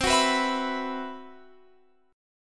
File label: C Major 7th Flat 5th, Guitar Open F, chord; CM7b5; strummed